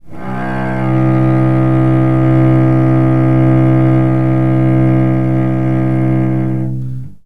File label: c string open, cello